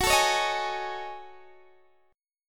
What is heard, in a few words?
F sharp Minor 6th Add 9th, Guitar DADGAD; chordshape; down